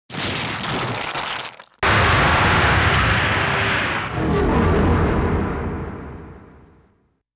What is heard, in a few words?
Ambient Music; The Last Blade; Shigen Naoe Stage Introduction